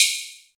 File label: small reverb open hi hat sample d key, Royality free open high-hat tuned to the D note